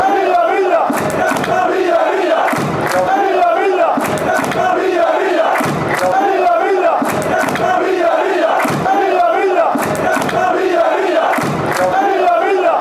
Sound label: villa villa, fanchants